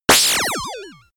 long laser shot; krieg-waffen; soundpool

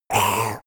monster hit gurgle; koerpergeraeusche; comedy-cartoon; soundpool

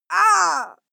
female fight shout pain; schreie; menschen

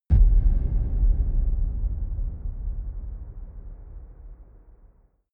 dark outer space shadow, hits impacts

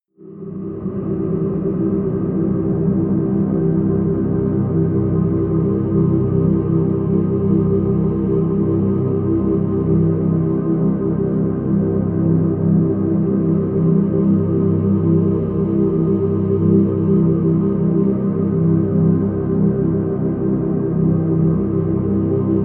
drone roaring

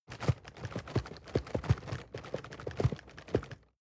cardboard box medium shake soft; objekte

